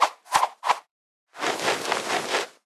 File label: Penguin